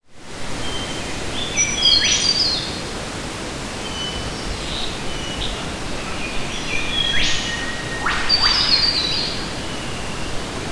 nature rainforest subtropical australia wind trees birds; zapsplat